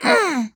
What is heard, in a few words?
voice female effort quick action; Infernal Radiation